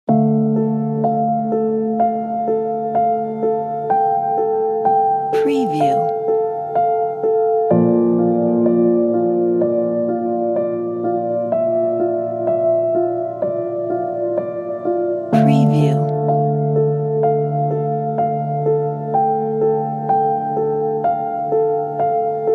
emotional sad piano

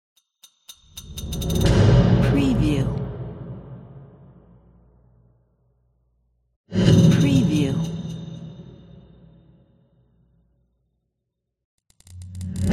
glitch woosh transition

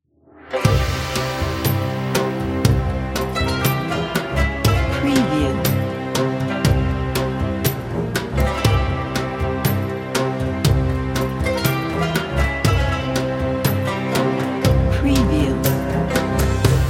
mid eastern charms

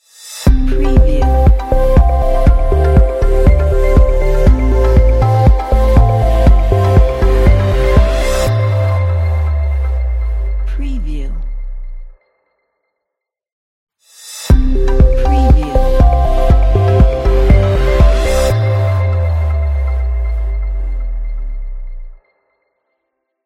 corporate logo ident

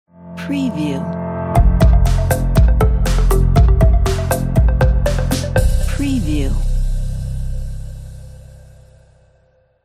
corporate work logo

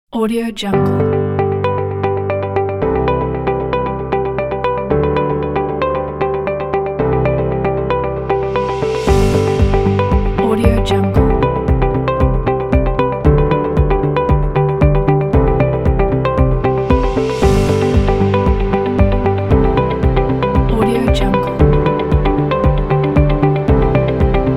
podcast corporate

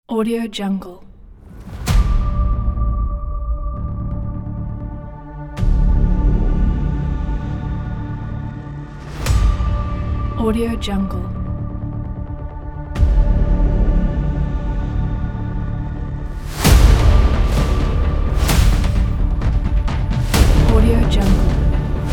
trailer

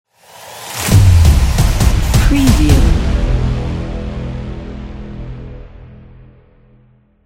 cinematic trailer outro hits

